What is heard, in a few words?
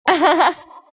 laugh; dota; crystalmaiden